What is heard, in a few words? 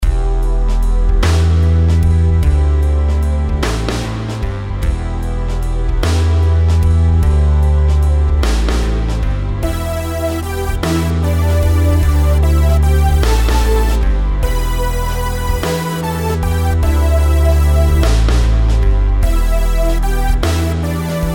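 melodies compound 8s E minor; melody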